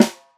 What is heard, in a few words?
thick snare drum a sharp key, snare tuned to the A# note. Loudest frequency: 1057Hz